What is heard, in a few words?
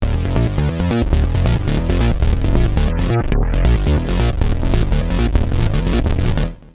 Dual Triangle; V-Synth